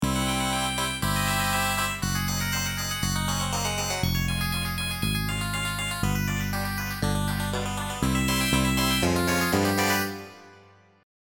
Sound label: duck chase, loops